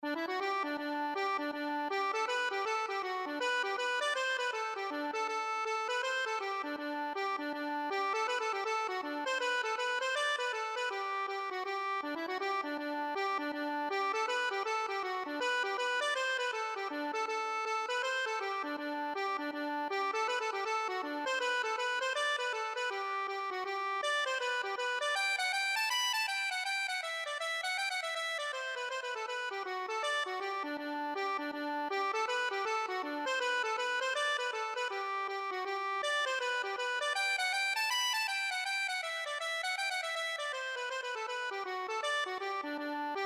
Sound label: glengariff, accordion